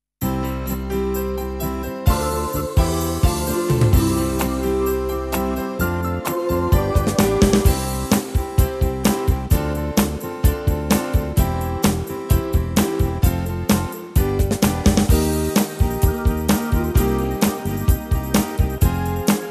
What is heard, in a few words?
Modern Beat Pop Town; styles tyros2